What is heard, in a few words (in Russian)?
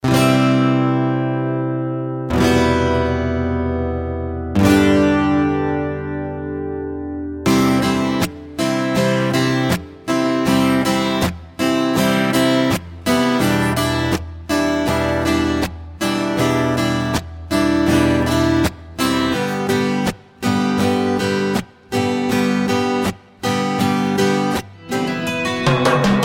akusticheskaya gitara